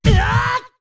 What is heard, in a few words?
Big Fall; Fierce Deity Link landing from a big fall